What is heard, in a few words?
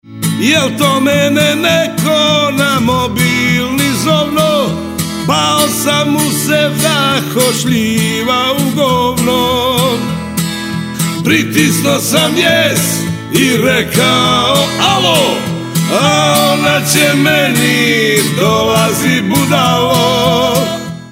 Mobilni